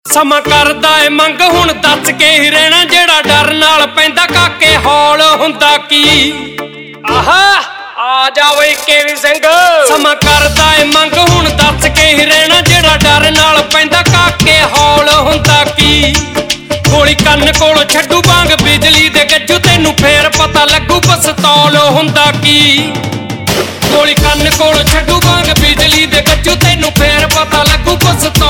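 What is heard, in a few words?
Ringtone, Punjabi Pop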